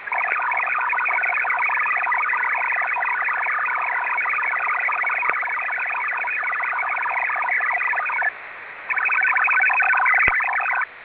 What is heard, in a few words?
MIL STD 188 141A DBM; MIL-STD 188-141B 2G ALE with DBM-mode (Data Block Message mode)